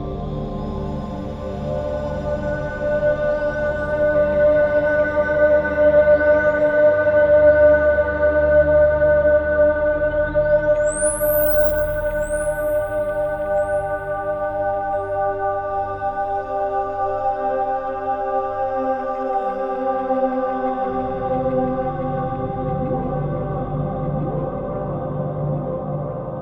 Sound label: Percscape; Sonic Foundry (Sony Creative Software); Ma Ja Le; Soundworlds